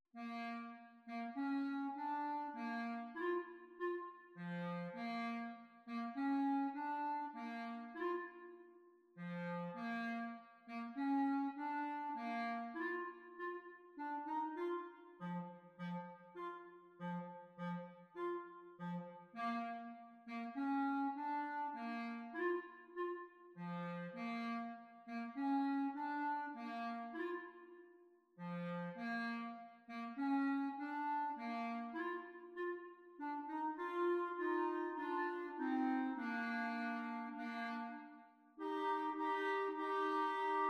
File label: Clarinet Duet